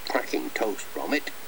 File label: cracking